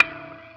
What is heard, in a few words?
Guitar Knock